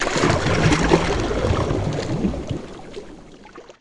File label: Canoe Paddle Stroke Underwater